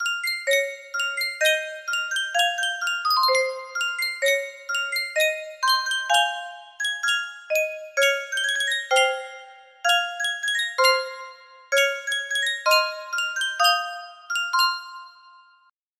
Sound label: Sankyo music box, music box melody